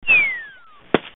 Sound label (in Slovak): Pad a clap; Revelation Sight and Sound SK